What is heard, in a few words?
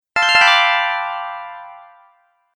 Sound Confirmation, Beep Sound Effects